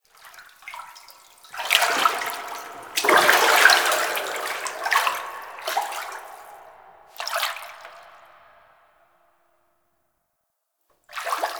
Foley; Warframe; Tau; Cinematics; BornAnew

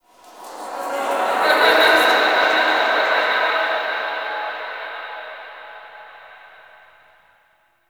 Memory Echoes, Ambience; Warframe; The New War; Zariman

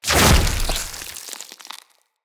Root Spider Elite Web Shot Proj Burst; Animals; Spiders; Abilities

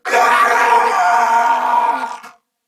Death, Male; Corpus Venus; Warframe; CorpusVenusMaleA

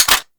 Heavy Pistol Mech B; Warframe; Weapons; Zariman Heavy Pistol

